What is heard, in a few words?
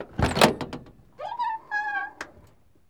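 PaRKiNG BRAKe; E-MU Producer Series Vol. 3 – Hollywood Sound Effects; Transportation; DoorsHoodsBrakes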